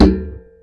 Tin Bucket, contact mic, Metalics

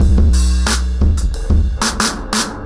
90BPM, ACOUSTIC DRUMS

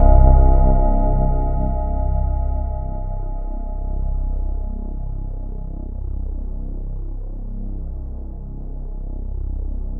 C1, Synth Line; 90 s Sample CDs; NiceBeats; SynthLine VOL-31

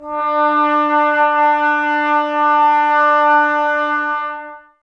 brass, Tbs Mute

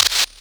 clap, Korg Prophecy

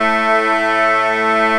BAGPIPE; E-MU Formula 4000 Series Vol. 4; Earth Tones

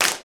CLP HANDS, Handclaps; Roland LCDP14 Africa VOL-2

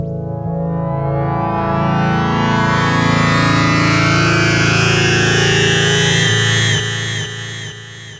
FX; AMG Megabass REMIX! VOL-2

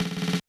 Snaredrum Roll, Technics U90

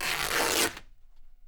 rip, Canvas Bag Rips; E-MU Producer Series Vol. 3 – Hollywood Sound Effects

